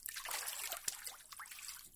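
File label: light wave playing on a sandy shore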